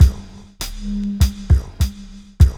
LOOP; E-Lab; X Static Goldmine 2; akai

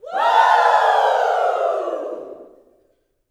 "WOO", Gospel, YELL SHOUT; Spectrasonics; Vocal Planet Vol.1 Gospel; akai

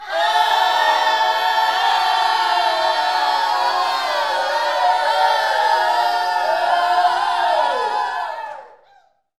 screams, gospel; Spectrasonics; Vocal Planet Vol.1 Gospel; akai; UYC SHOUTS

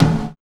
TOM; E-Lab; X Static Goldmine 1

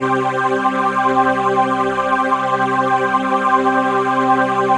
PHASE PAD, ATMO PADS; Best Service; XX Large

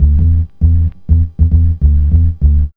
B-LOOP

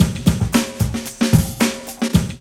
LOOP, DRUM; E-Lab; X Static Goldmine 2; akai

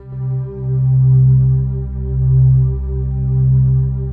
pad, atmo pads; Best Service; XX Large

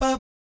"bap" C4, Vocal Jazz Fx; Spectrasonics; Vocal Planet Vol.3 Jazz Fx

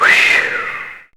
sigh; E-Lab; X Static Goldmine 1

